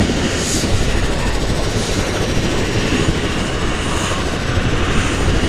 spin lp; smite; guanyu

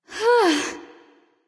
nv sigh; smite; awilix

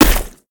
Impact Large; smite; god bakasura

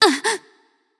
surprised, voicepack; smite; pele

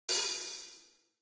drums hh open; smite; raijin

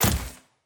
movement run; smite; vamana

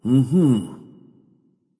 agreement; smite; voicepack; hou yi

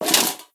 armor legs; smite; god arthur

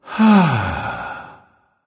sigh; chiron

